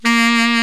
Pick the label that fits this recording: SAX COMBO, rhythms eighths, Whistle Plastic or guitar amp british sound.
SAX COMBO